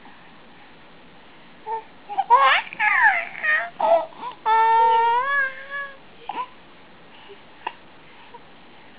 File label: vowels